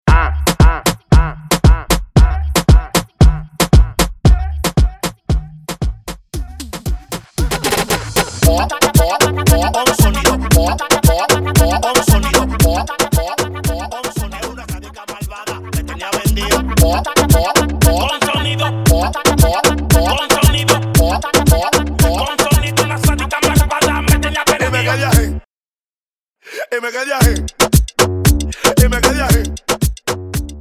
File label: Intro Drop 115BPM